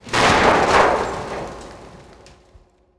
metal debris

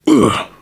shoved

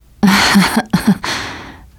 laughter, teen girl

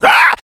been shot, infected; npc, action; Left 4 Dead 2

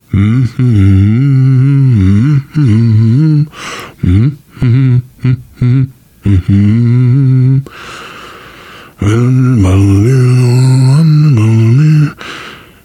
nervous humming, survivor voice, biker

